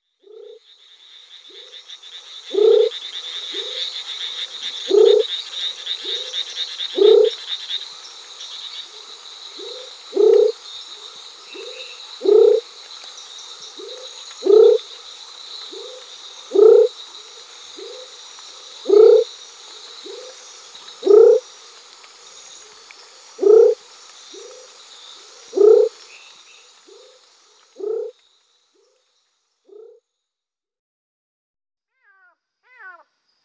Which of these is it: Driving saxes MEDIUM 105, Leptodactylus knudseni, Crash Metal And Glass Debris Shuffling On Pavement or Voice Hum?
Leptodactylus knudseni